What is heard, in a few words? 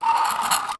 Impact Plastic Drag On Cement Short; Impacts